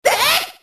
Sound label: attack; snes; smas; jupiter